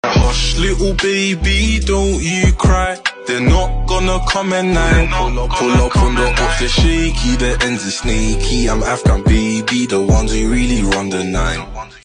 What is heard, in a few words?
hush little baby